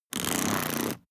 plastic mechanical wheel rattle

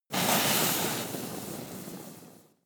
volcano oneshot lava sizzle

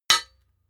hammer hit stone short

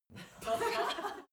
laugh female short

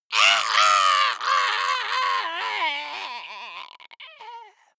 monster death scream high

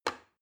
plastic button start release heavy

